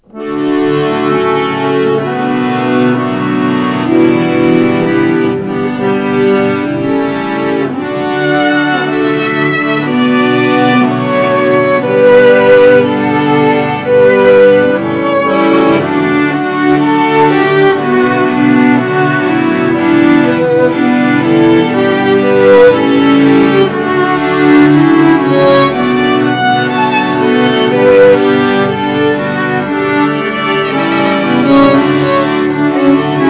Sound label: cannon, accordions